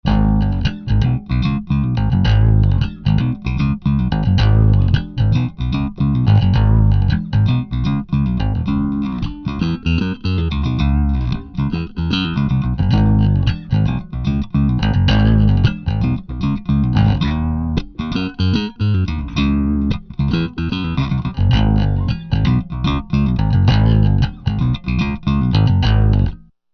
riff GT10B default SVT w cab

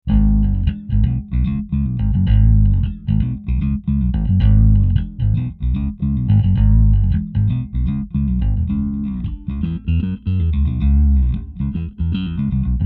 riff b15 cab

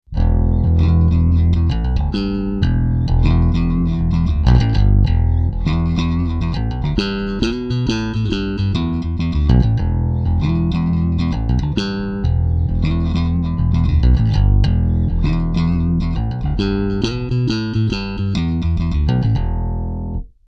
riff svt pre

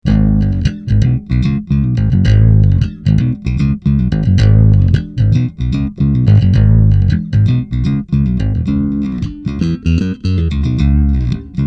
riff svt

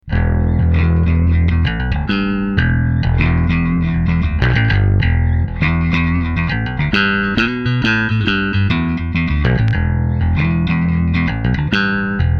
riff b15

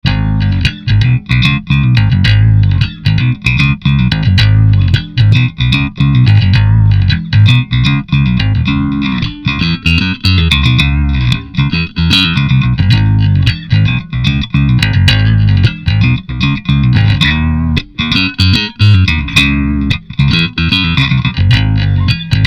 riff Ampeg SVX Rock SVT4

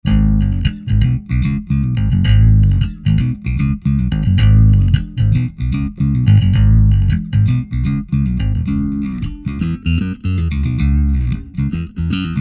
riff Ampeg SVX Rock SVT-CL

